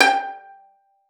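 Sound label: violin pizzicato G5; Xsample VOL-5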